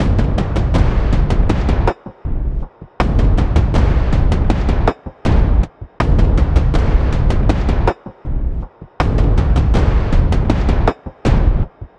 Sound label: Percussion Loop 80 Abnormal; Drums & Percs; Percussion Loops; Ghosthack